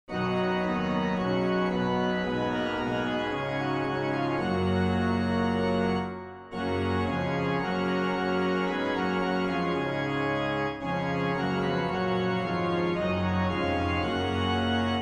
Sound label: Organ